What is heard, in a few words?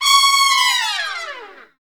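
trumpet fall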